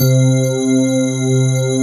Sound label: BELLS, JV 1080; Pro-Rec - Synthsonic